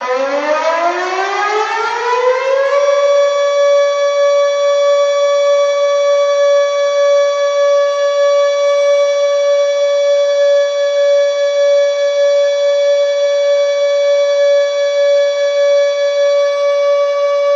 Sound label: Siren